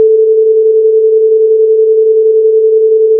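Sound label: sine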